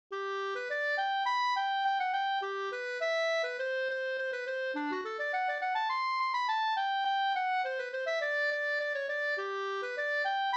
polkett; Gladare an du tror e August Bohlin Up

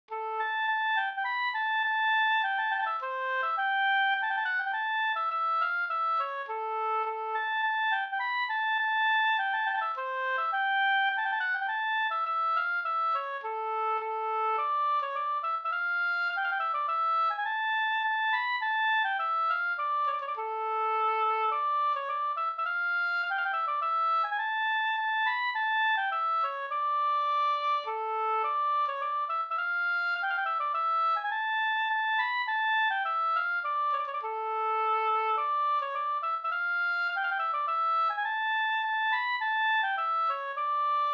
polska Bodapolska

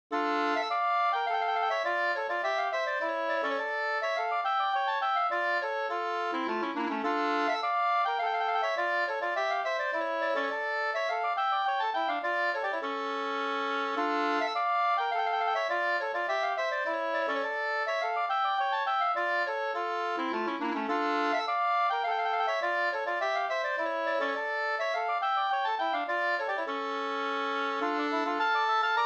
polska Bingsjopolska; e Pekkos Per 2st

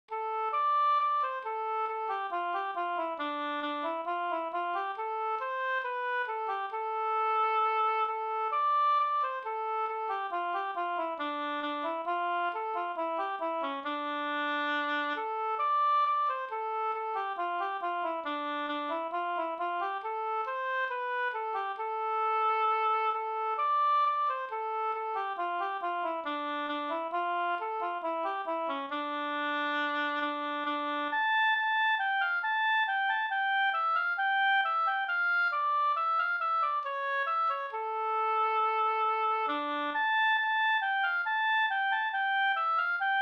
ganglat; Skattungbyn Da

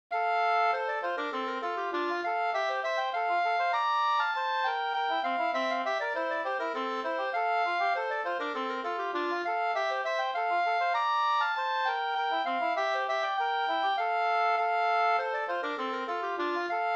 slängpolska

